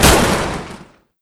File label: lightning; SupaLidlGame